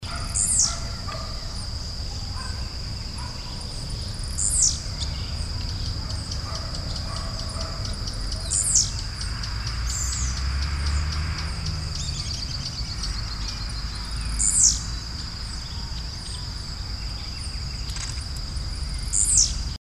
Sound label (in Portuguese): tiziu, aves